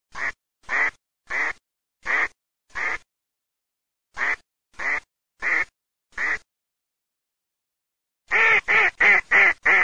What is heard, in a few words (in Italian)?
germano, birdsound